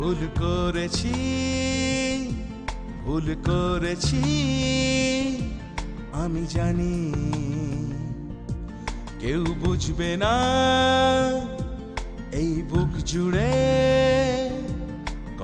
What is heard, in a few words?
bengal; classical